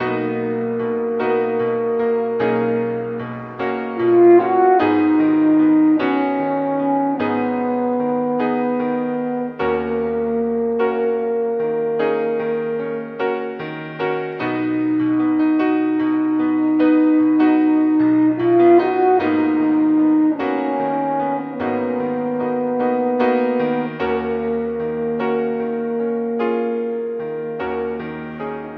horns and piano slight funk; Loops; Sad

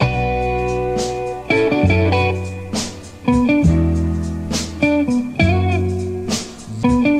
guitar blusey, Loops, Phonky

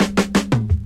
Drum loops, Rolls

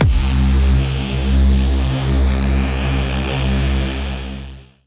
grindr, synths; Notes

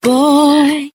"boy" SUNG; ooh ahh

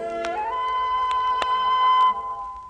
flute

